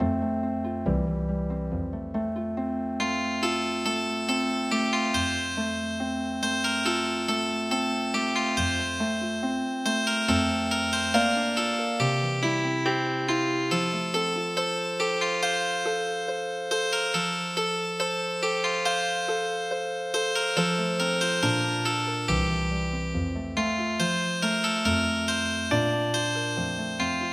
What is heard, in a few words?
Healing Harp